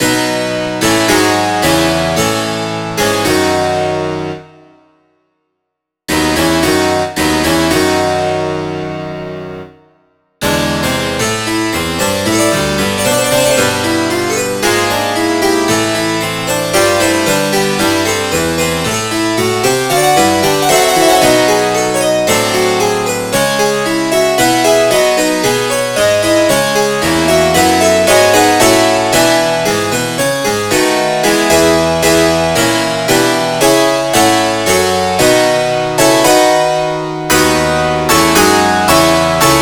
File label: harpsichord